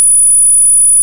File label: noise